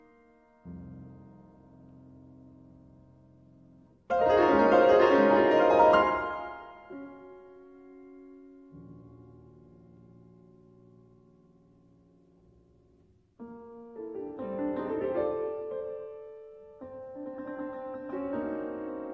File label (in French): Etudes Pour les quartes